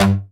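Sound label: synthesizer Dance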